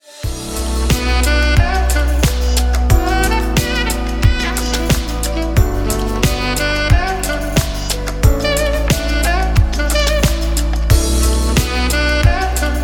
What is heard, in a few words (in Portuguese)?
Saxofone